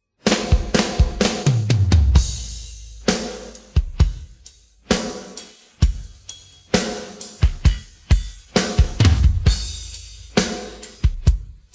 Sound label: pattern, drums